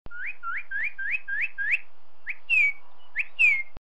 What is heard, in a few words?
Cardinal Bird Singing